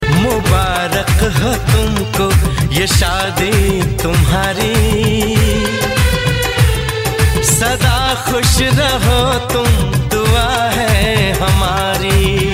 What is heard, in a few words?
Hindi Marriage Tone